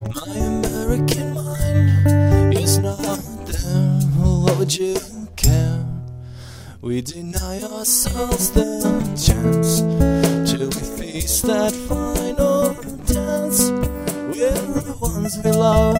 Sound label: backing